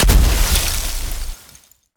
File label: ice prick explo